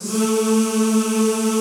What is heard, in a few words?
ZZZ A2, Classical Choir; Best Service; Extended Classical Choir